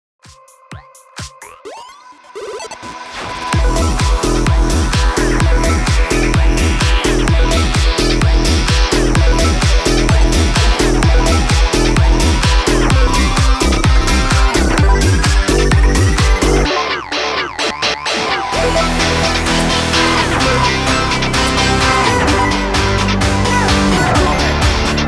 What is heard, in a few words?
128 BPM